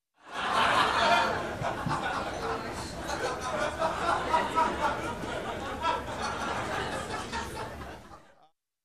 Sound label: People dinning laughing distant